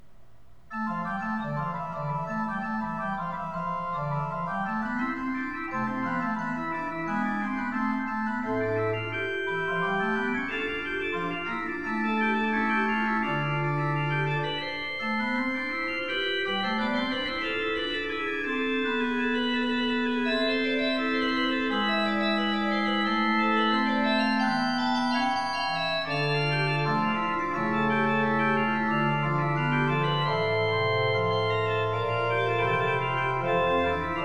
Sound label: Fugue, Organ; Magnificat primi toni; Johann Pachelbel; Complete Organ Works